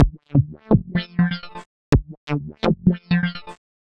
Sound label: synth 125 tack; Synth Loops; Transmission-X